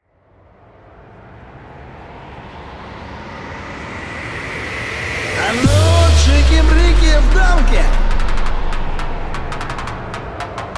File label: Band (Instrumental); Friday Night Funkin vs C.F.M.O.T